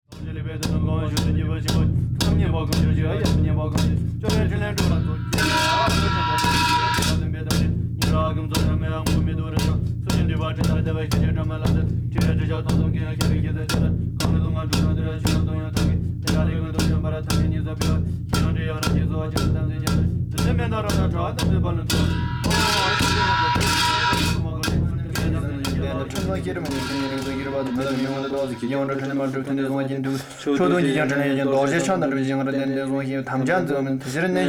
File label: Chant